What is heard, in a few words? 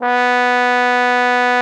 TROMBONE; Xsample VOL-3